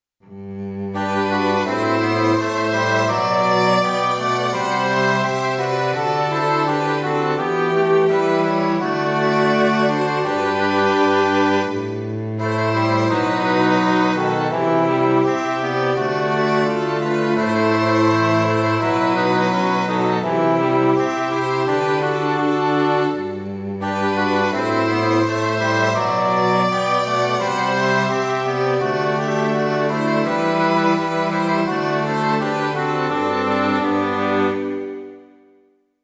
Good News For The Shepherds; Fl Ob Eh Fg Str

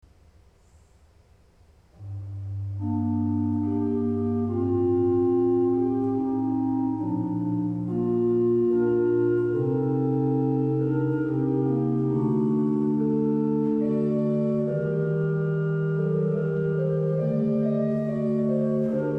Organ; Lazarus Death And Resentment

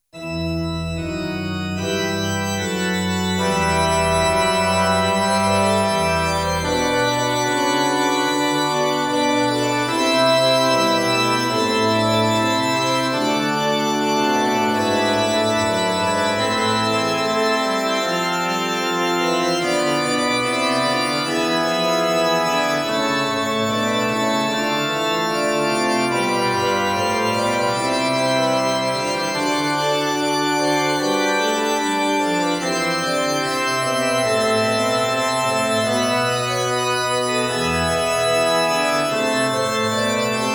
Midi Journey To Bethlehem Fl Fl EH Fg CO QN 74

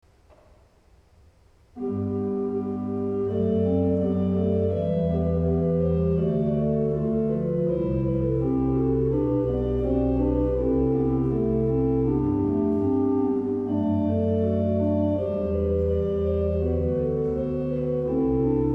Organ, Aria; Symmetrical Aria

